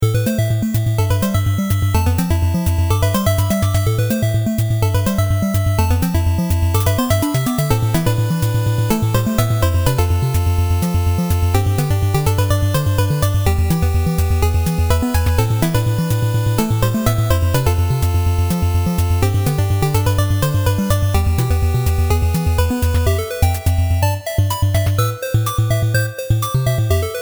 Battle Plane; Ambition of the Slimes; 3DS; Android; PS Vita

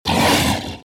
Monster Roar

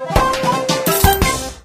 achievement got

